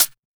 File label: Closed HH